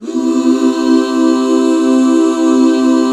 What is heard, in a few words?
C MAJ, Back Chords; Sound & Vision - Gigapack II CD 1